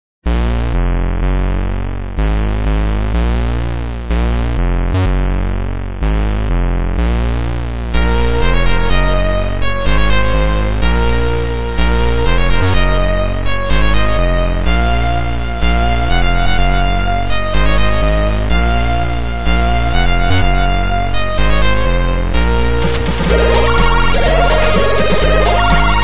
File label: song; sven